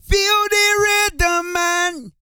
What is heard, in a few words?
REGGAE, Vocal Planet World Voices